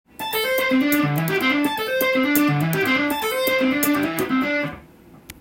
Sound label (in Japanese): kantan taping; easy tapping phrases tab